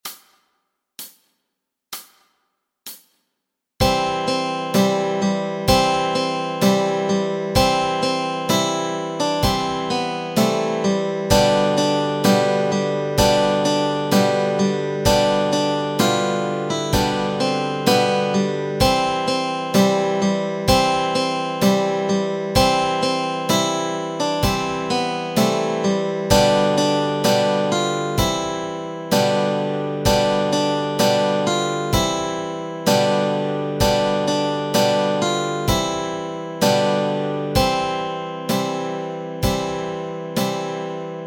Who Did Swallow Jonah melody and chords

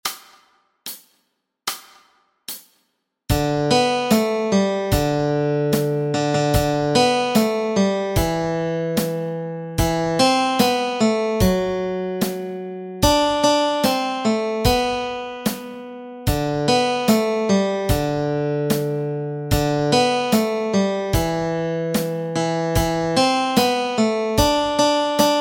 Jingle Bells melody

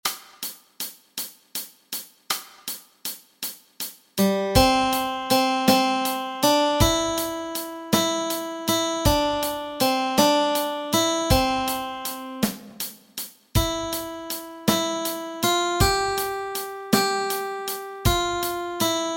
Melody Track

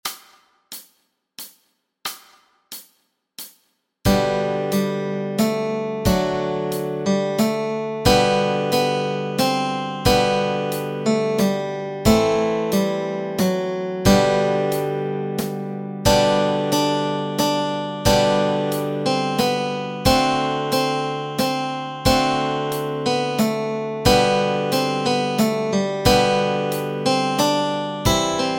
Melody and Chords Track

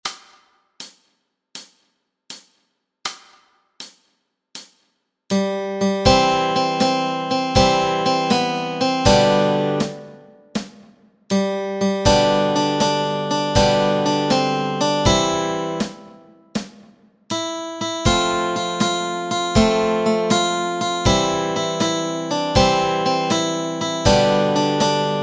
Melody and Chords Track

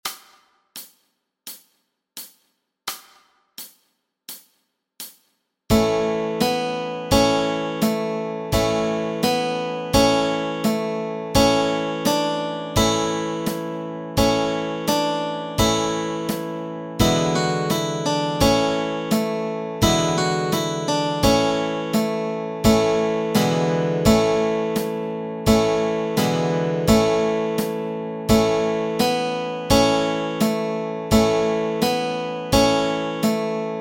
Melody and Chords Track

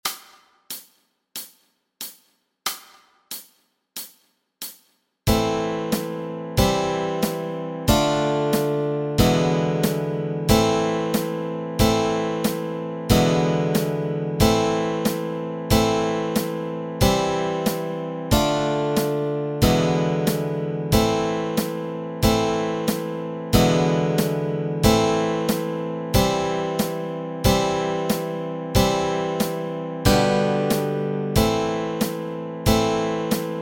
Eerie Canal Chords, Easy Guitar and Ukulele

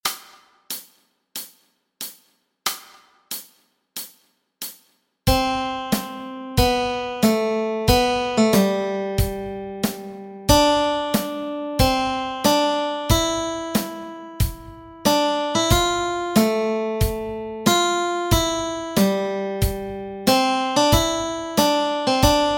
melody

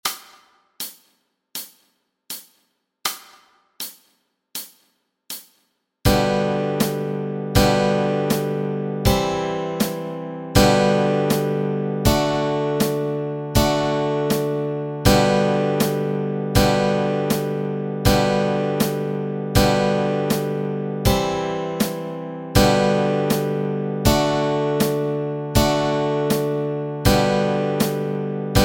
chords, Easy Guitar and Ukulele

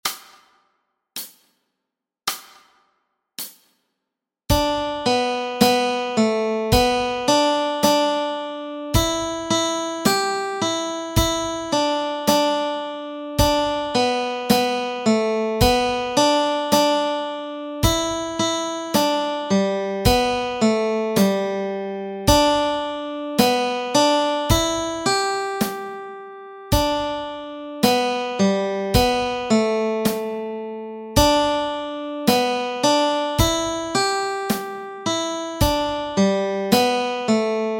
melody

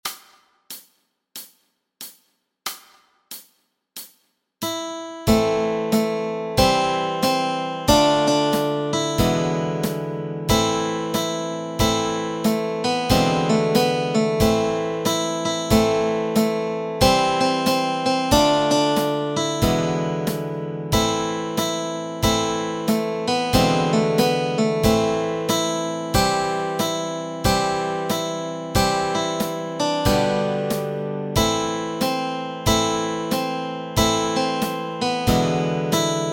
Eerie Canal Melody and Chords

